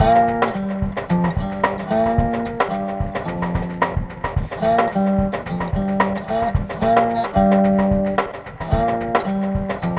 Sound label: music